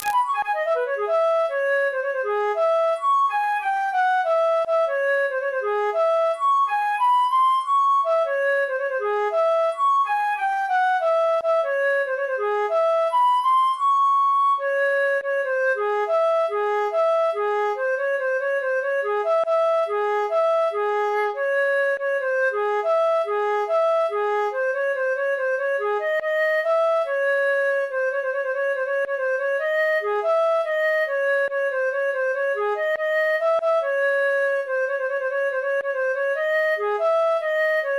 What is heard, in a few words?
dead end flute